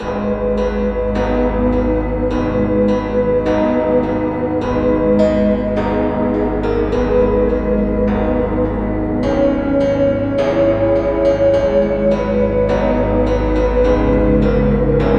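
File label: Dark Jingle Bells Main melody; The Long Reach; PS Vita; PS4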